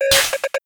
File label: jumpmod deny